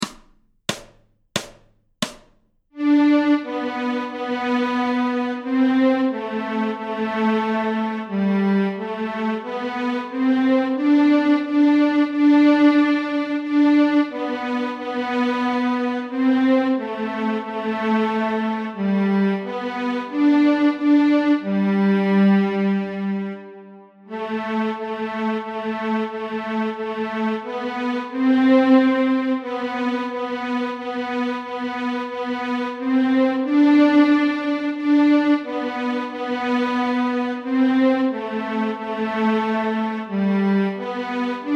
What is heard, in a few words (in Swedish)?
Stråk G, Nyckelharpa; Majvisa; A-strängen Steg 1 Två toner i dur